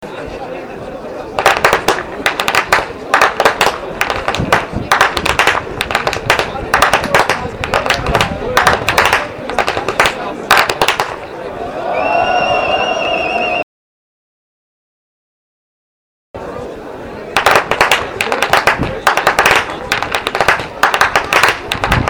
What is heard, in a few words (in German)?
schnalzen; anthering